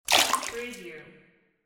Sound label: water splash